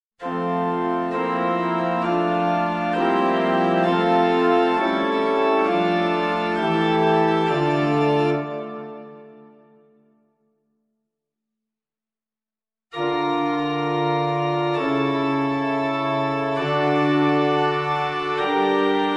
Instrumental

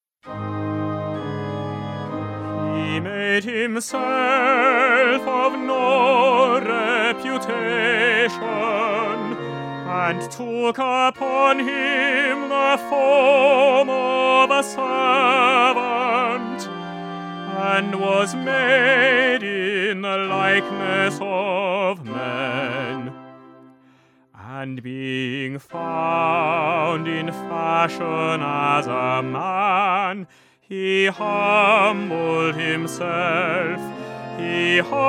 Concert Version

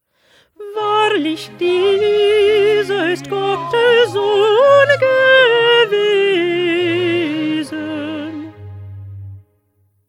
Alto; Bach; St Matthew Passion

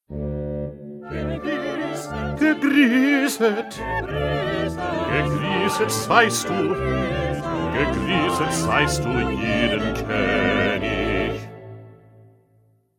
2nd Bass

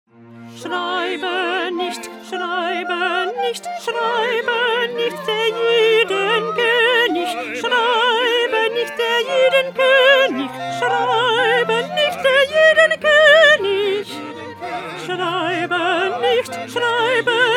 Alto; Bach; St John Passion

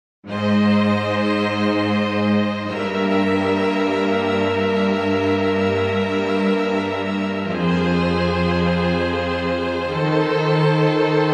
instrumental; Handel; Messiah

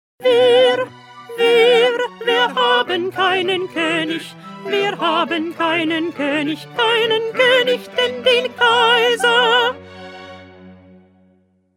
Alto; Bach; St John Passion

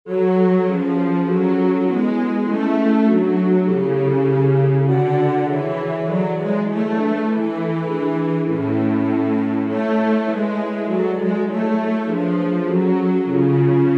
Instrumental; Bach; St John Passion

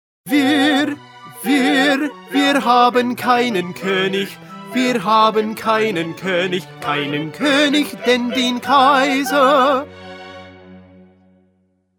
Tenor; Bach; St John Passion

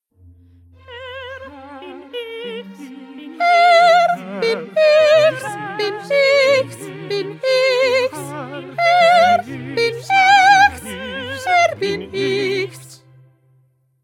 Soprano; Bach-St-Matthew-Passion-15

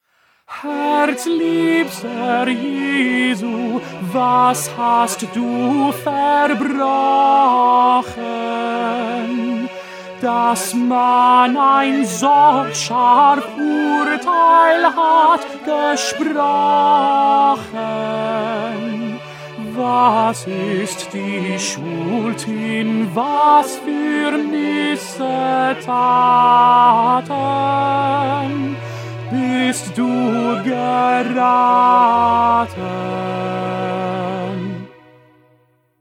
Tenor; Bach; St Matthew Passion

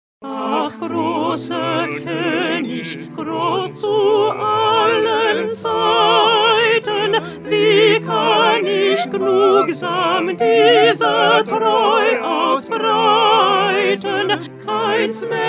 Alto

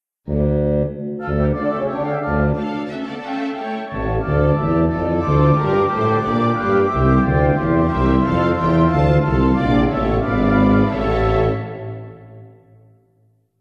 Instrumental

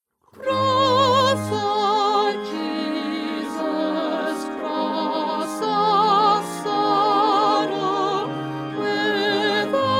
Soprano; Stainer; Crucifixion

